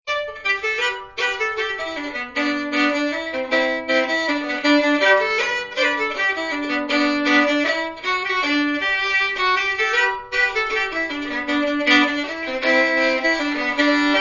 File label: mazurka